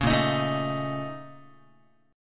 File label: A sharp Minor Major 11th, Guitar; strummed; 4,6,6,7,7,x; 4A,4E,5A,5D,5G#,6C#; JGuitar